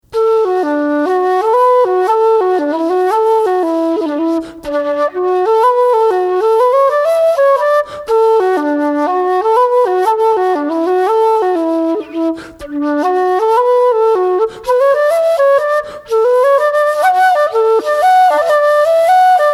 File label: Reel Slower; Doon Reel